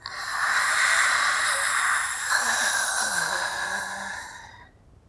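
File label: female zombie; Project Zomboid v41.73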